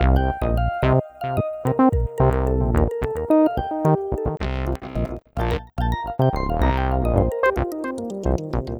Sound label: Acid Squelch, Bass; Sonic Foundry (Sony Creative Software); Synchro Funk